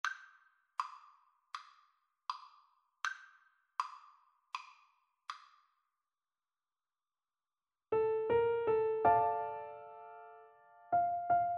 piano duet

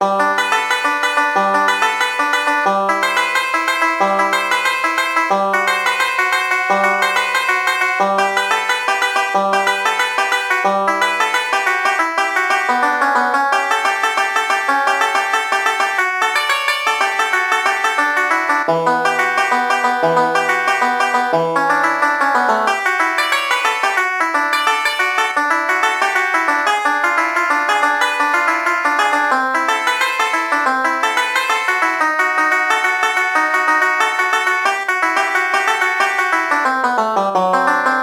banjo

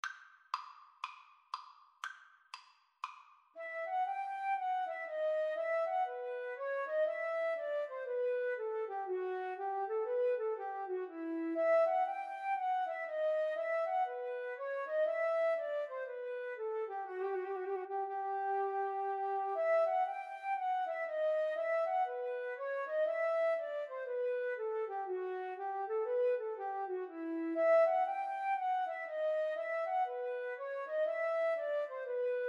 bach bouree, Flute Cello Duet

